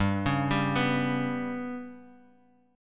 G Major Flat 5th G

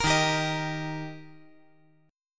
Eb Major Eb down 5G,4C,5E,5A; strummed; JGuitar

